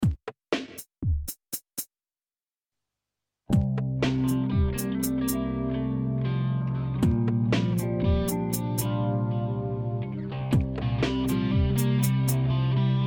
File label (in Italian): 7 4 Improvvisazione